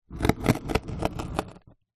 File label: cardboard box score with sharp knife; foley